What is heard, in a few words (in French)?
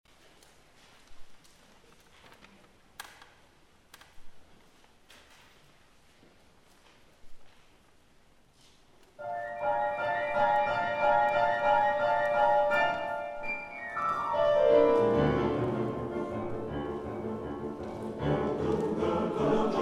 Clochettes; enregistrements concerts; Concert Pont Croix; svetlina